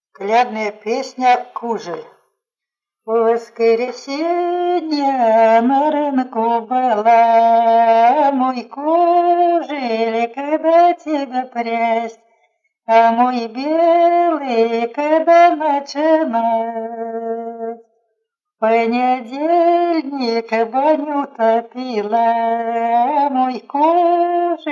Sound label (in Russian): В воскресенье на рынку была колядная